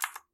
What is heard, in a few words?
keyboard type; Golden Crayon Sounds